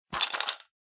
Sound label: Pencil Case Open